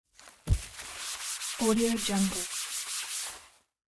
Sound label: wiping off